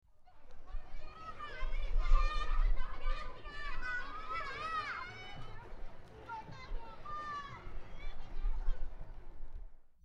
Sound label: Playground